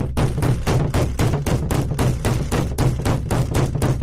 scary intense knocking

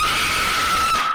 horror scream high quality

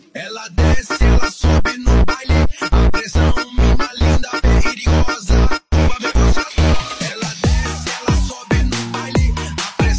phonk

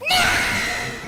car die sound effect; garn47

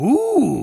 ooh; spy

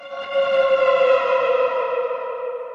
ghostly sound

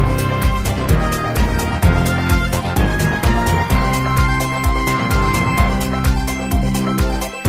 win music; brawl stars

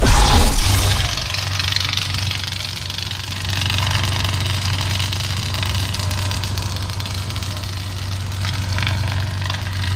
lightsaber ignition; kylo ren

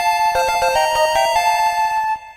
level up video game sound

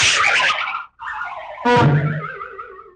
swish jitter whistle up and down honk

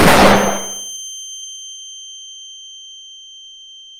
flashbang full out

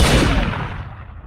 gunshot

